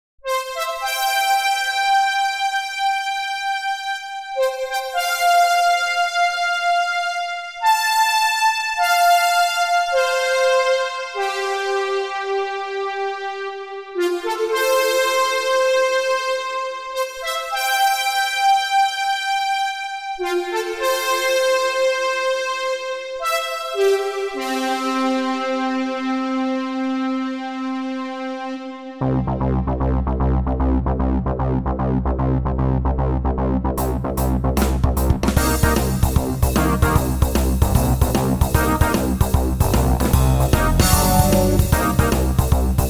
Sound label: fanfare; performer by superwave